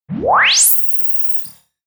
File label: Zap Computer Processing Scifi Digital Interface; Sound Design Future Telemetry; Tom Hutchings